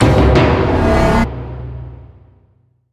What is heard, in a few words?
Ui Frontend Mission Start; Space Tyrant